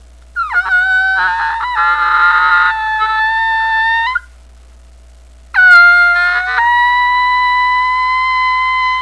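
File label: low squeal, turkey call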